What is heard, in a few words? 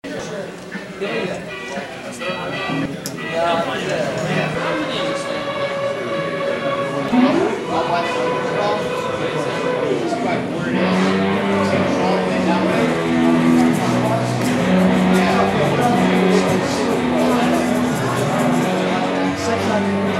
guitars store